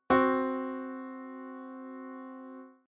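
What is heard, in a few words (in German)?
Intervalle Quarte C simultan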